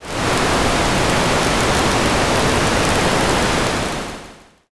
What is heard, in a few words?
fire